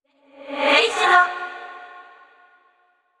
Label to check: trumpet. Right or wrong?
Wrong